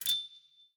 Folder Open; Samsung SM-W2014

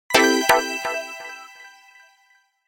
Calendar alert; Nokia X2-00

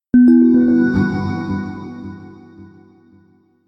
Message

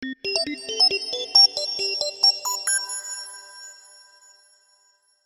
Polyphonic; Yezz; Andy-A5

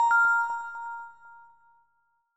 charge full; Vivo X80-Pro

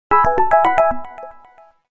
A toy watch; Samsung GT-B2710; SMS

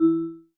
Class Harp

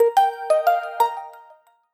Epic Sines; Lenovo Tab3 Essential TB3-710I

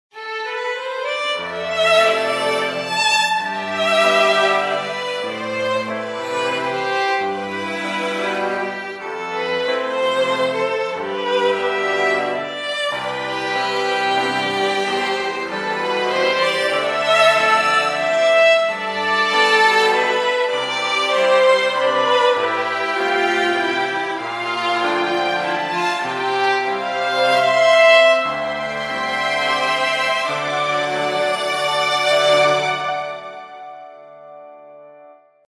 Waltz; GC900 Viewty Smart

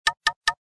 Message tone; LG; UX220

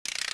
End, Keytone sounds; LG GD550 Pure

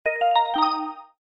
Notify Ring; Okapia Air

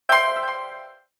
new game, notifications; HTC One-X

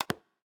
lock, LG Stylo 4 Plus ui

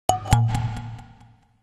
Alert Link; HTC Touch Pro2

